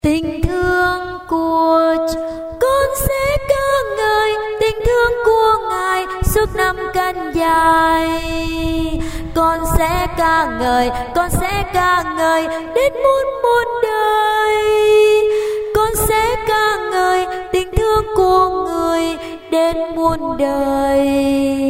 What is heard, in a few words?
sop alto